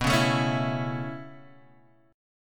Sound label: B 7th Suspended 2nd Suspended 4th down, chord